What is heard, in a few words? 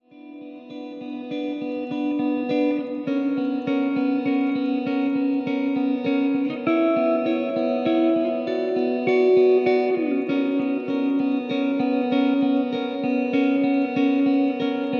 Instrumental Biolateral